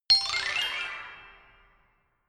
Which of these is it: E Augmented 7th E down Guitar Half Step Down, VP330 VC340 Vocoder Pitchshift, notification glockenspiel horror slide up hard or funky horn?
notification glockenspiel horror slide up hard